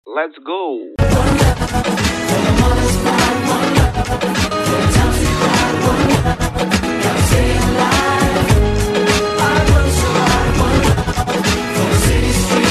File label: dhol mixx ringtone